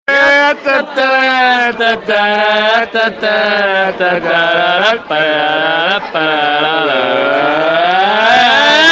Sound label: clown tune